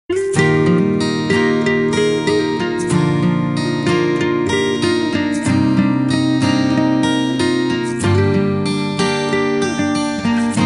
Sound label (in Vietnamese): nhac hoa